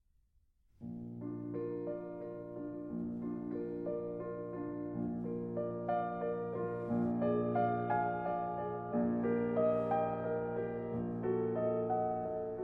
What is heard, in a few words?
Ave Maria Soprano; Schubert; ofertorio